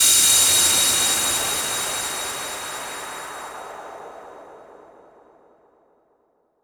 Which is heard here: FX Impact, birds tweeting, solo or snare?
FX Impact